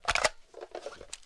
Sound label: m82 mag out, sniper; weapons; garrysmod